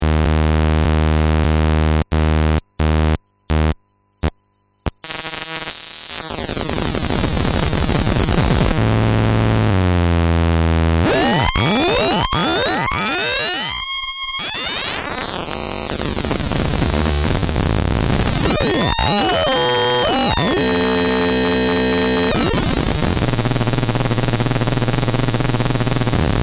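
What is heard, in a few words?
hellfo sq pwm, synth; hoel LFO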